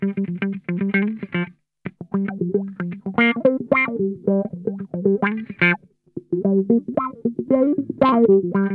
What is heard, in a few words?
SKIN DEEP, Funk Guitar; 90 s Sample CDs; Zero G